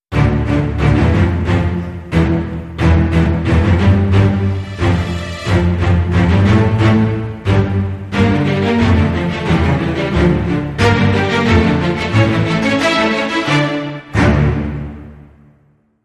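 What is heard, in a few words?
Hybrid Sting, Orchestra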